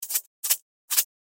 Salt shaker shaking; cooking

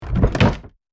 Platform wooden game menu ui tab switch

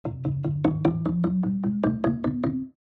Cartoon ascend

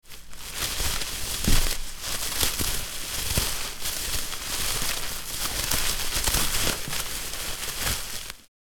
Fishing net handling rustle

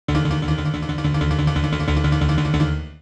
Cartoon sneaky

